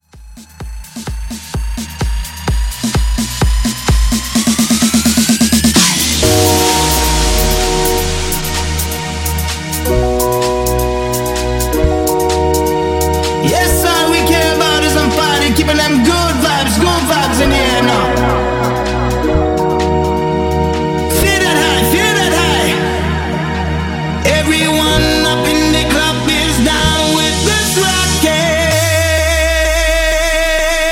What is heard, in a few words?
House 128